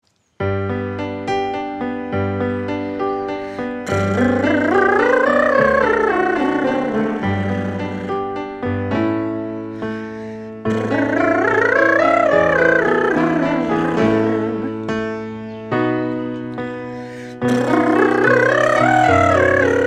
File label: Backing